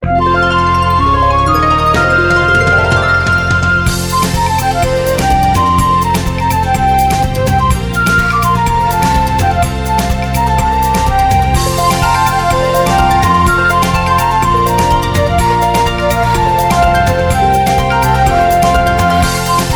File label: Minigame Victory